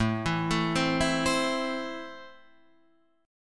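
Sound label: A Minor, Guitar Standard, chord strum; 5,7,7,5,5,8; JGuitar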